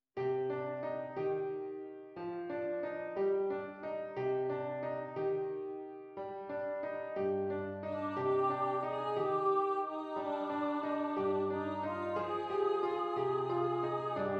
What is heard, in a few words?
Nimue Prophecy PIANO; Arthyr the Boy Who Would Be King a Musical